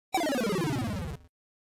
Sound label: Loss